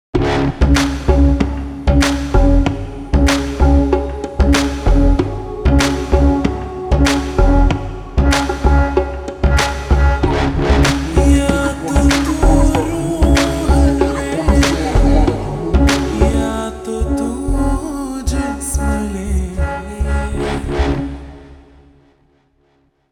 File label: Ringtone Slowed Reverb